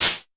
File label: CLAP, Vermona Mono15 Drums